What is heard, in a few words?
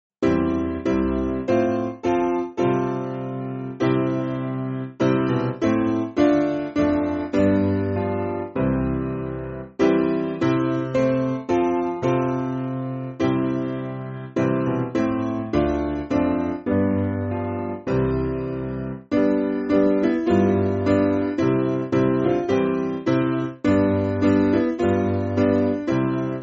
Piano, church music; God Is Born; Gid Sie Chrystus Rodzi